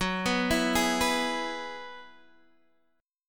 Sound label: Gb 7th Suspended 2nd Suspended 4th, Guitar, Open G